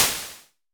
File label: SN Basic, Vermona Mono15 Drums Snare